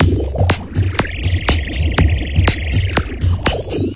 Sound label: 121 bpm electro session, drum loop